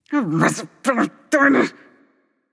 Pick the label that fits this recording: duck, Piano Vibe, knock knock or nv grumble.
nv grumble